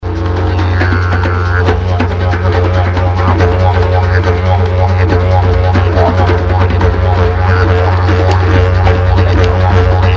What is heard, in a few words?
didgeridoo